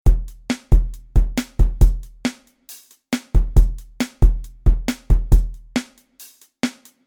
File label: Epic Remix Drums